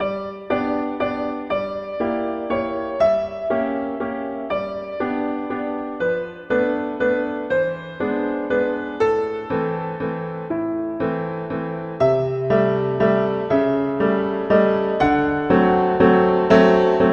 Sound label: Hand Organ; Tchaikovsky